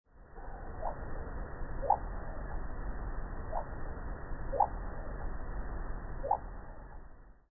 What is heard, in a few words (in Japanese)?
yotaka, nikko